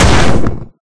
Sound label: pickaxe